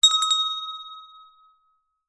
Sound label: bell small hand ring short weak